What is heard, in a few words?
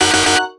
Sfx American football